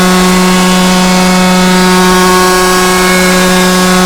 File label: KART EX ONHIGH